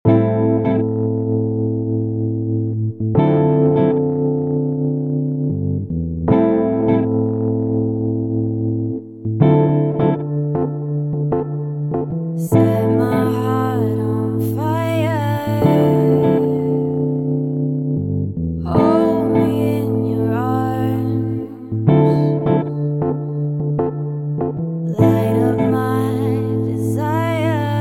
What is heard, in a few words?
Lounge Groove